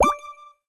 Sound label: ui multiplier Increases; sweet shuffle